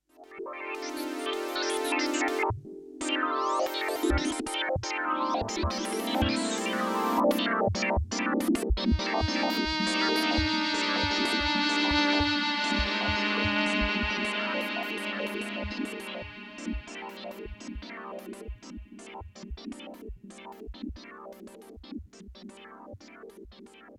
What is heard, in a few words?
Roland JX 08